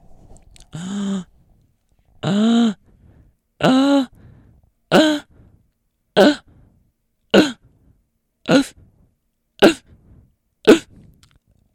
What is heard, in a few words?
inward snare, beatbox